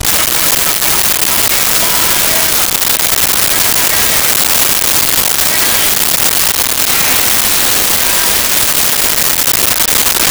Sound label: riddim again, reggae fx; Dancehall FX Packs